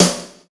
snare power; Sonic Foundry (Sony Creative Software); Ugly Remnants VOL-2; Beatstuff

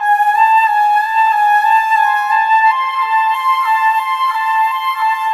Flutes, Cinematic; Sonic Foundry (Sony Creative Software); Orchestral Series VOL-3 - Cinematic

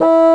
WINDS & BRASS

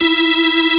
B3 E 4; B3 LES SM

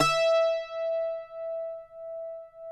cuatro E; Spectrasonics; Hans Zimmer Guitars VOL-2

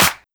Claps; Vengeance; Essential Clubsounds Vol.4

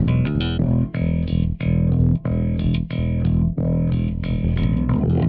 Delay, Bass; Effected; Bass X

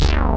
WIGGLY BASS, Synthsonic; Pro-Rec - Synthsonic; BASSES

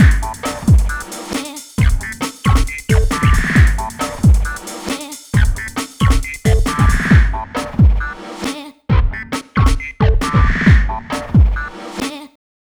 Hardcore Breakbeat, 135 Dmin; Big Fish Audio; Hardcore Breakbeat Action; Gimme Soul

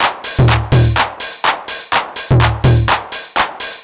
drum loop hardcore top 125, Classic Chicago House; Sample Magic; Drum Loops

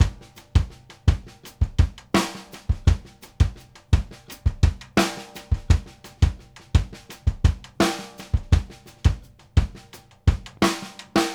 RIM + SNAR, 84; Sampleheads; New York City Drumworks VOL-1; SP ORLNS 84

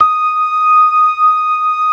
ORGAN, LOWER MANUAL

